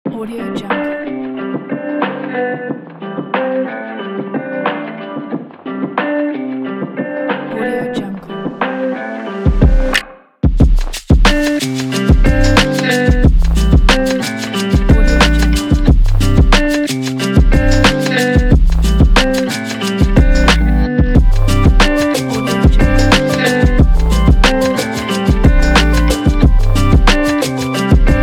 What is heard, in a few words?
guitar beat